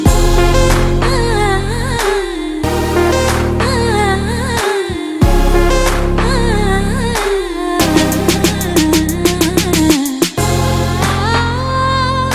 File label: BG Tone